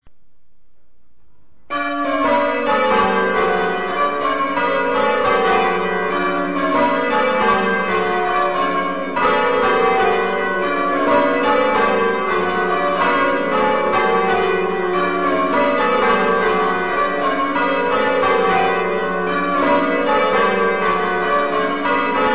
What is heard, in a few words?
open, ringing